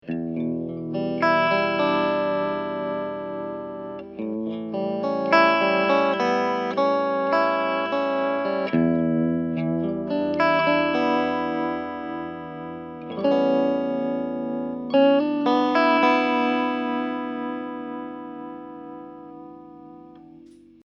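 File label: vibe speed 11h depth full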